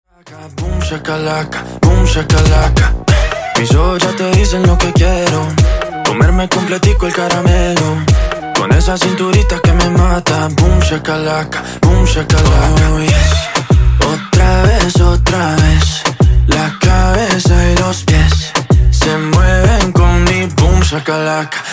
96 Bpm

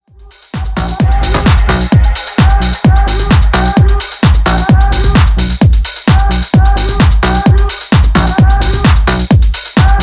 Rebass 130Bpm

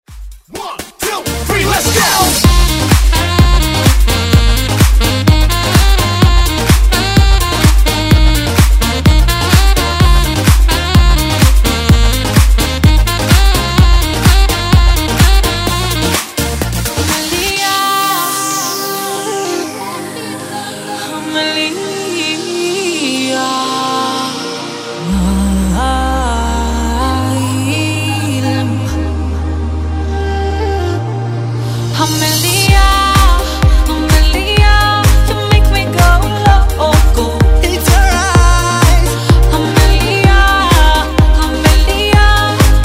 Latin Dance Redrum

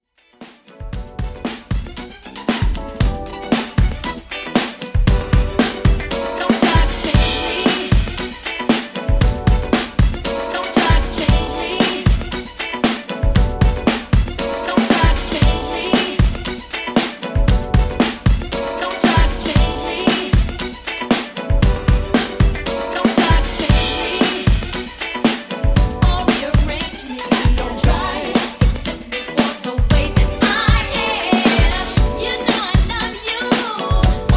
80s Redrum 118Bpm

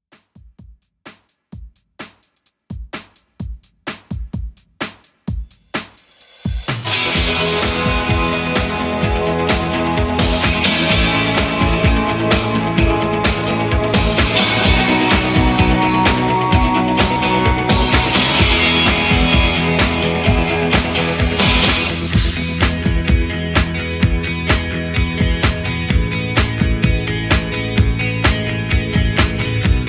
90s Pop Rock Redrum 128 Bpm

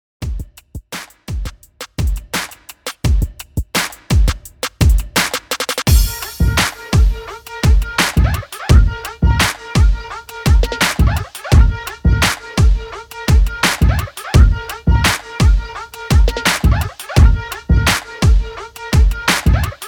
REDRUM 85 BPM

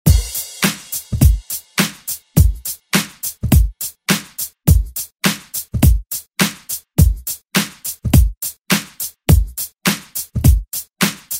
ReDrum; DJ Mhark

